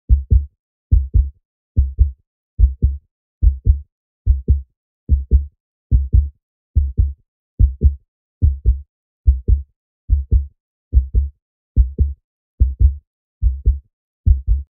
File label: Heart Beat Fast; Hollywood Edge; sound designer's toolkit v2